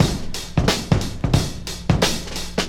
89 bpm drum loop sample d key, Free breakbeat - kick tuned to the D note. Loudest frequency: 1680Hz